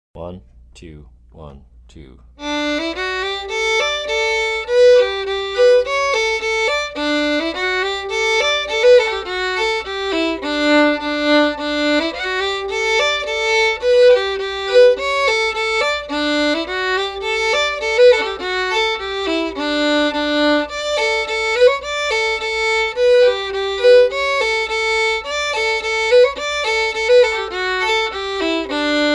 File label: polka fast